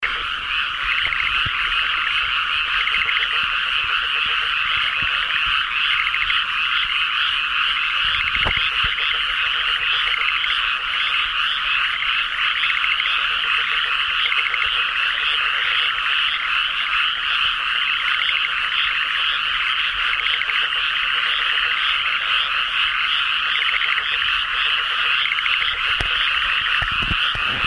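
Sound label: frog, Japanese tree frog; amagaeru; shure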